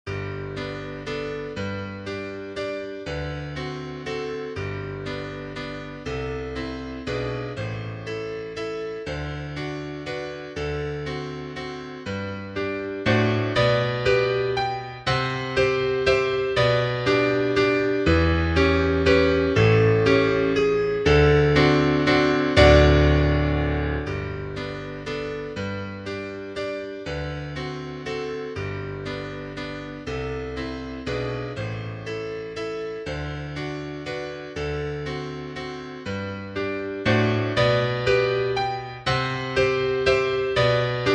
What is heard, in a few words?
piano